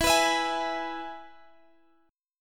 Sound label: E Major, Guitar, Standard; strummed; chord; x,x,14,16,17,16